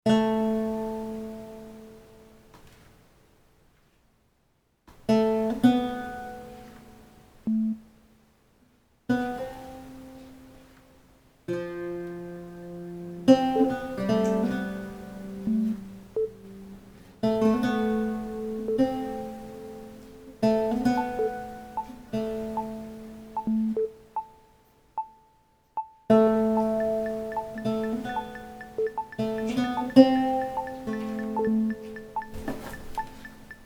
lute and sequencer; weeklybeats-2014

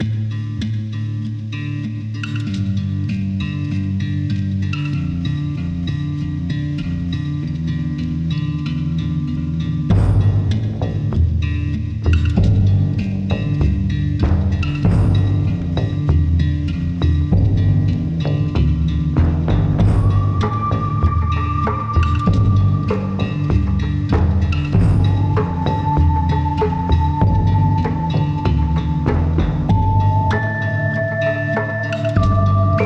sepia tone world

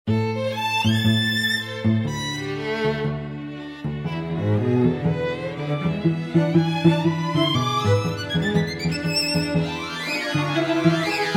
string swing; weeklybeats 2026

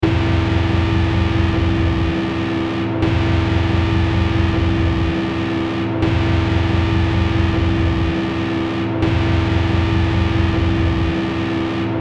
noisy dreamscapes; weeklybeats 2012

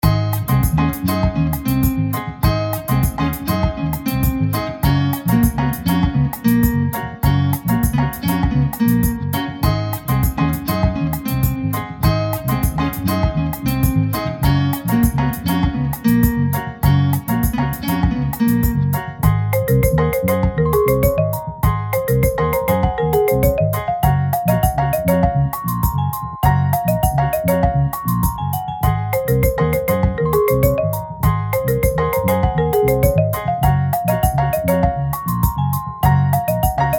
beach ringtone

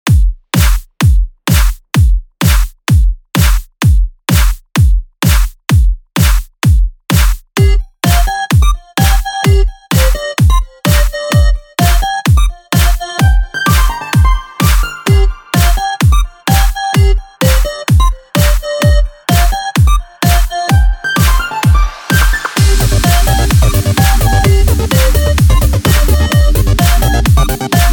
kick; weeklybeats 2026

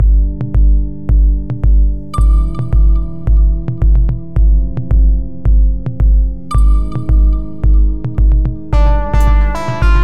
solar winds

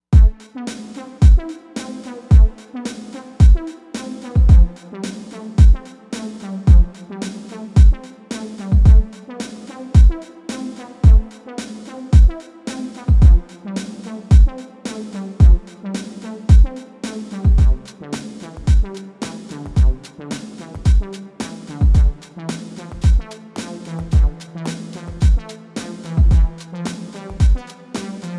Casio HT-700; weeklybeats-2020

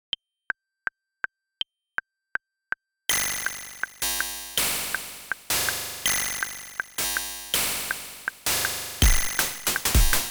percussion only opl; weeklybeats 2024

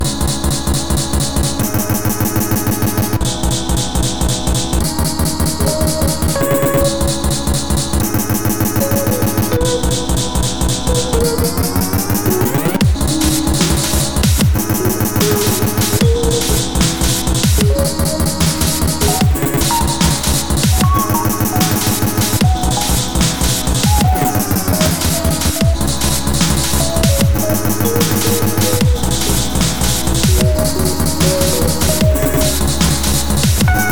crash

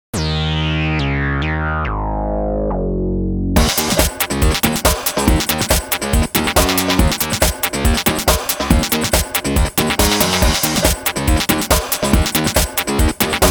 synth funk thing; weeklybeats 2026

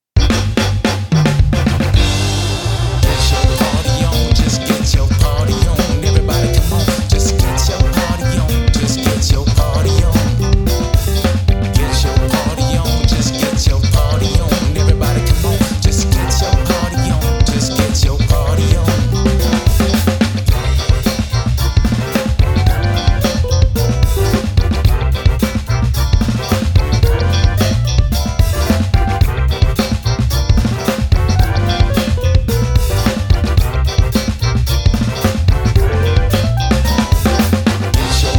old style funky beats; weeklybeats 2024

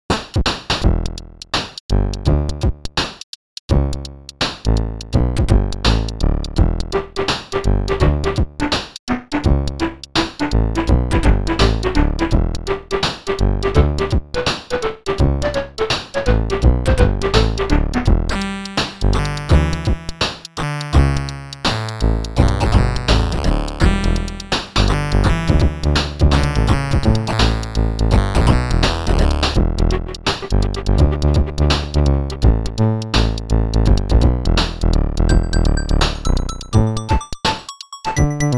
c64 shuffle sid2; weeklybeats 2026

